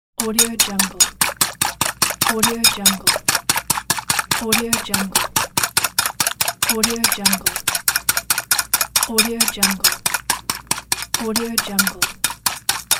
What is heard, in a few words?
beating eggs